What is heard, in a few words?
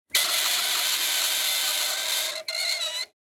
dry ice shriek - very hot knife pressed against block of dry ice